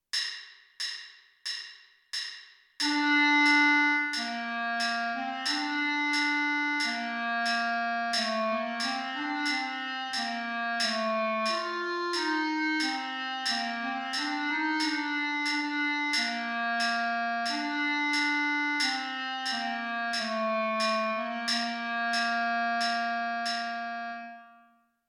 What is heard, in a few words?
clarinet